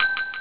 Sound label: ECHO BEL; BELLS